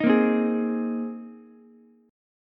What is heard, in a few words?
Bb 7th Suspended 2nd Suspended 4th Bb Ukulele, chord; 8,10,8,11; strummed